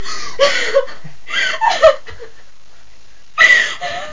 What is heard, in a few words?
CRY; AMIGAplus Sonderheft 25 (2000); Final Odyssey